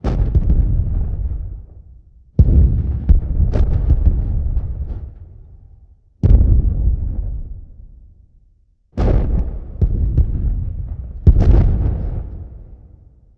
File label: Huge Explosions Distant